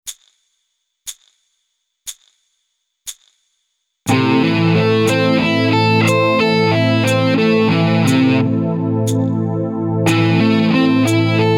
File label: Sweeping